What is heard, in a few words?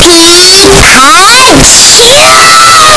pikachu cry; pokeyellow